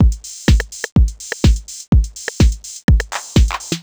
drum before full 125, Classic Chicago House Drum Loops